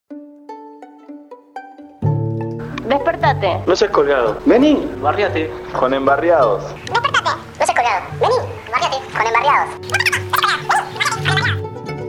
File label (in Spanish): Violines, spot; Bachi Bartolina Sisa; spots En barriados